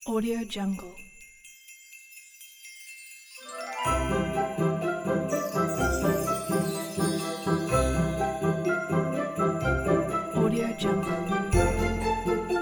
happy christmas

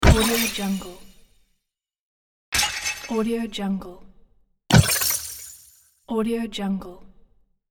game magic blood touch

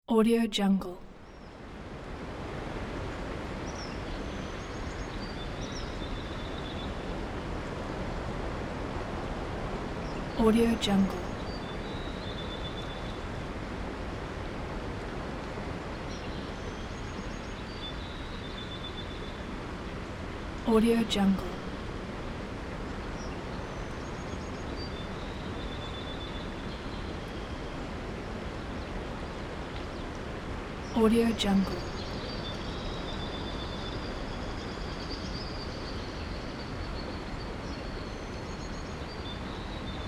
summer breeze

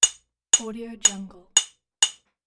hammer striking chisel loop

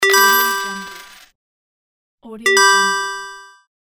game win money

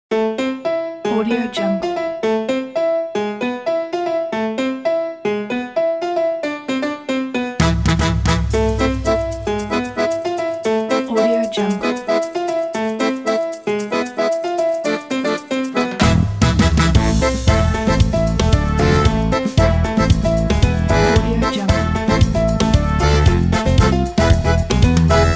the latin

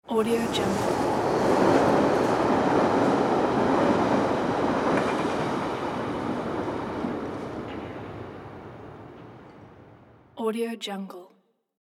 commuter train pass by

